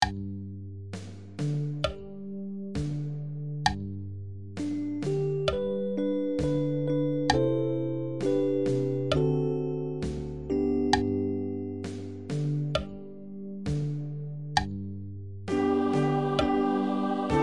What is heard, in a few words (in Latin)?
agnus Dei soprano slow; paulusfest